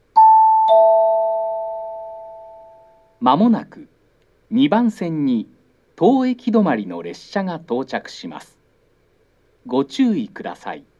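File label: Toyohashi approach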